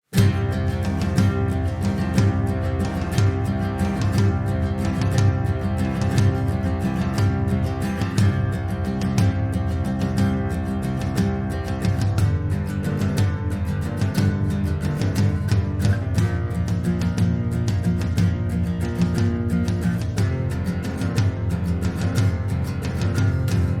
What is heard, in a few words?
Acoustic Guitar; BackingTrack; Game Of Thrones Theme